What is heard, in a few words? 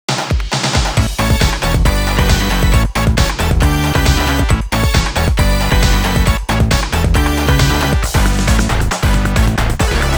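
Jingle Invincible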